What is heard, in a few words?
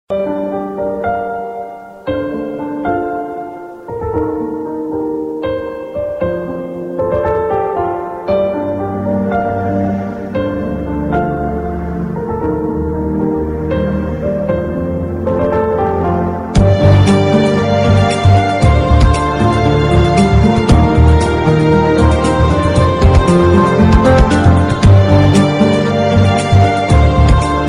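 Sad Piano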